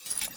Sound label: Idle Sword Drop; Arthur Hub; Warframe; NineteenNinetyNine